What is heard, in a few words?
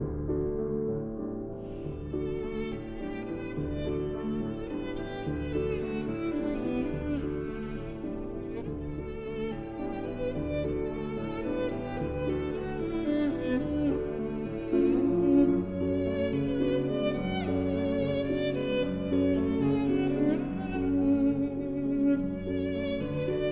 Violin and Piano in D